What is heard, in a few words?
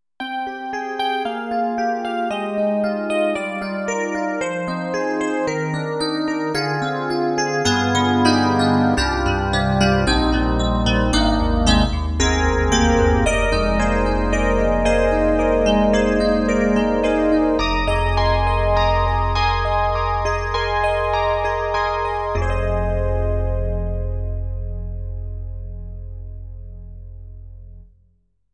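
SD-90 Enhanced Chorus Electric Piano; EDIROL; Chorus EP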